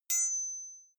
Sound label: knife hit metal ring